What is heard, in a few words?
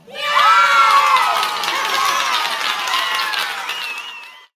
Cheers